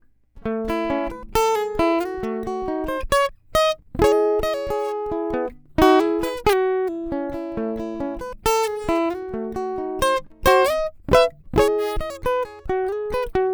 Raw, guitars

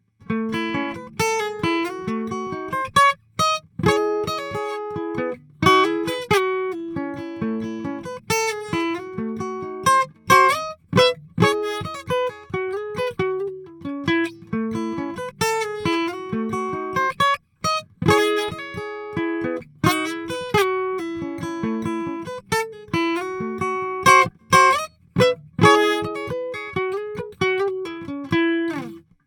Taylor 314ce Soundelux E47, guitars

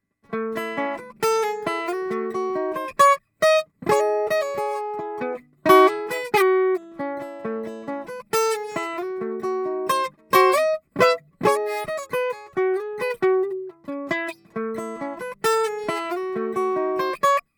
J45 Neumann M147

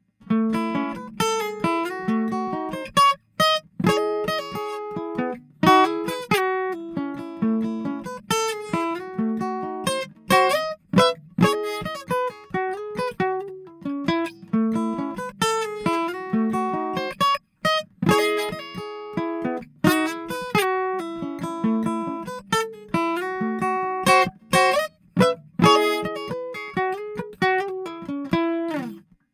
Collings OM2HA Soundelux E47

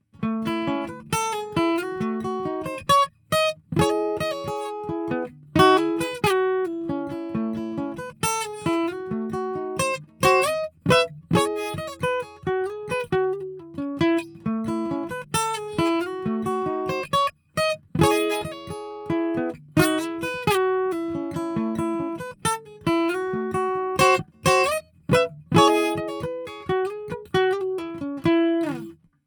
Hummingbird Soundelux E47

